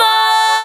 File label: Vocal Oneshots; Vengeance Samples; Vocals